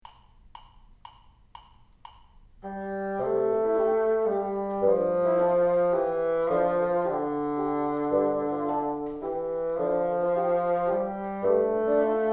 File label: together, bassoon